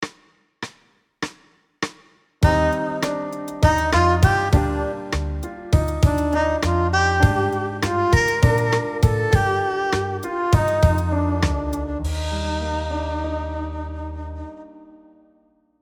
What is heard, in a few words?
Romantic scene sexy love sitcom music